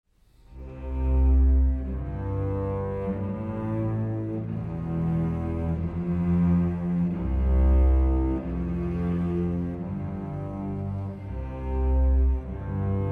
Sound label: piano